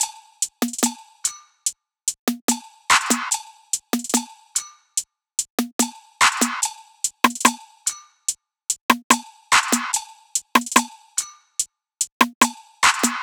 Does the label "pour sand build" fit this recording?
No